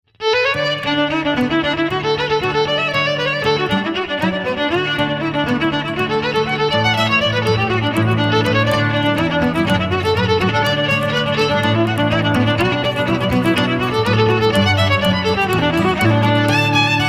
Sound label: Irish trad; Maids of Castlebar